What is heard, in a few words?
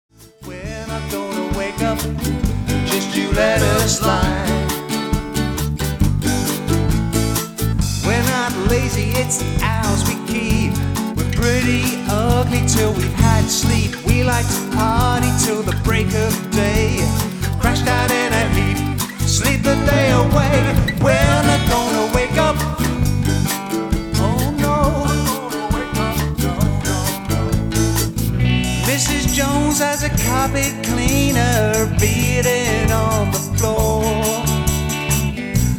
Ska